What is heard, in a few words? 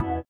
sitar, Indiatek; Spectrasonics; Metamorphosis